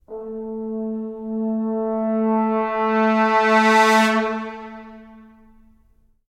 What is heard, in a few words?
Stingers Trombones